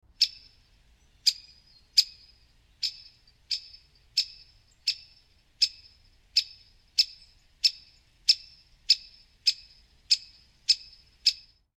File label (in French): pic epeiche